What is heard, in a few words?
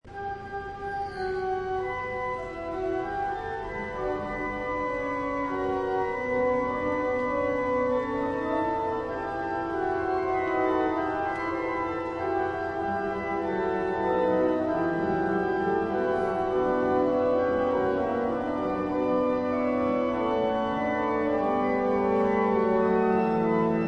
Organ interlude